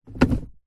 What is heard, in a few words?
vehicles car gear transmission stick manual movement into gear engine off; zapsplat